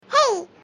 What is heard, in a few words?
monster click